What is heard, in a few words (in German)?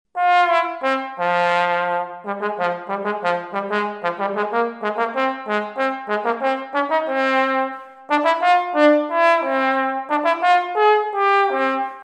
Tenorposaune Bolero 8er Style